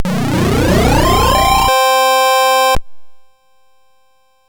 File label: Dragonworld sid MOS6581R4